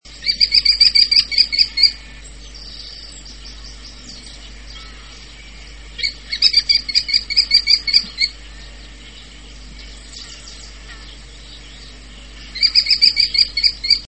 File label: falco tinnunculus, pustułka